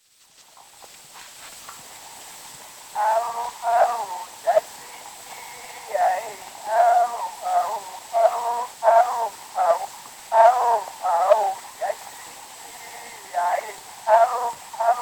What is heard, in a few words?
Zulu recordings; Father Franz Mayr; Ihubo lomkosi